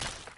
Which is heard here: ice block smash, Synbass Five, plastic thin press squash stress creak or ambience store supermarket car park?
ice block smash